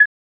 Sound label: single Beep